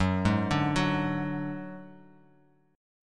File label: Gb Suspended 2nd Sharp 5th Bass Standard; Gbsus2#5 arpeggiated; 14,11,12,11